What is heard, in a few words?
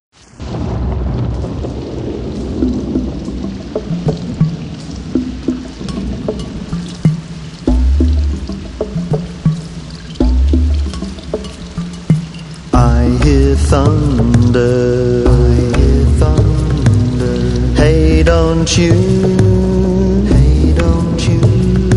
I Hear Thunder